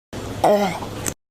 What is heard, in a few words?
Pufferfish AUGH